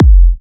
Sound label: Kicks F; markus hakala